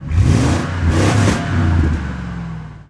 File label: Truck Expedition Rev; Trucks